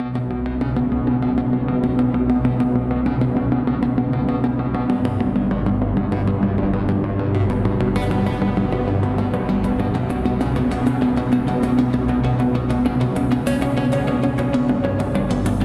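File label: Searching Pulse, B minor 98 bpm; MIDI Clips; Tonal; Motifs; Ableton; Mood Reel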